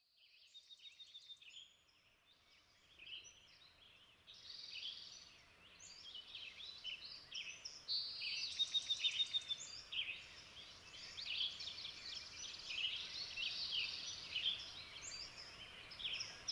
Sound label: Sonoma Ambiance